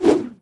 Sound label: foot swing, combat